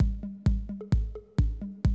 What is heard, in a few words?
130, Classic DrumLoops